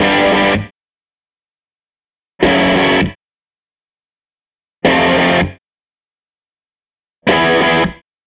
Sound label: SG Guitar Loop